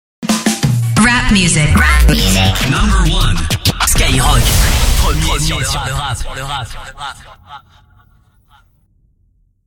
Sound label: RAP MUSIC, JINGLE; SKYROCK